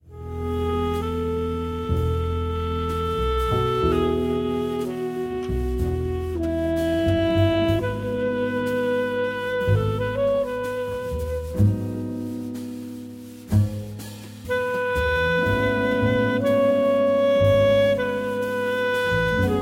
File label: piano concerto